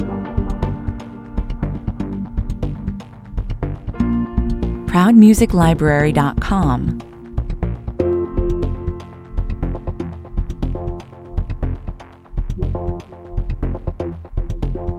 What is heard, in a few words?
technological systems on hold loops 120bpm